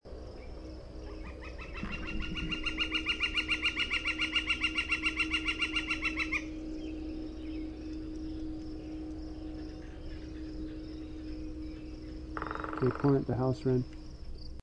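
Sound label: Northern Flicker drumming long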